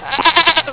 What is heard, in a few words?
sheep